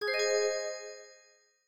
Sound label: win Sound, roulette; advanced-roulette